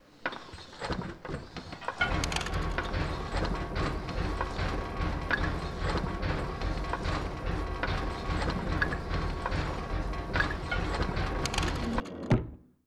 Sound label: Water Disk Puzzle Door Open